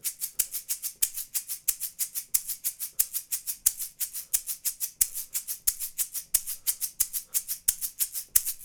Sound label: shaker mk-101